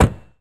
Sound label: Prowler Door Close, Automobiles